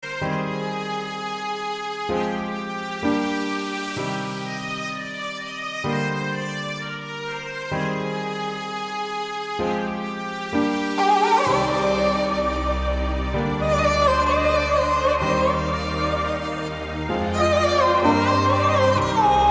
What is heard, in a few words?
Song Instrumental